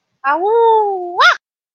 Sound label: awoo